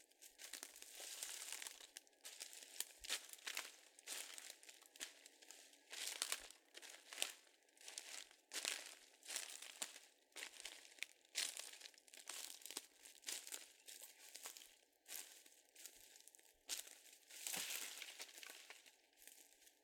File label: Walking On Forest Floor